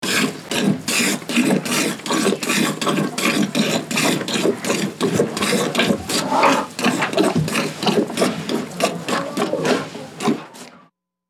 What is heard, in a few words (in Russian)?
zvuk dojki korovi